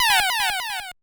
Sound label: lose; BatsAndPray